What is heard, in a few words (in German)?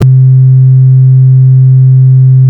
sinus; emu workshop